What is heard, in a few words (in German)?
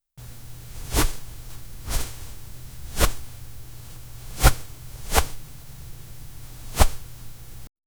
haut streicheln, touch